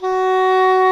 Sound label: DOUBLE REEDS; OMI - Universe of Sounds